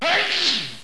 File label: Ahh-Choo; Sound Library 2000; Wayzata Technology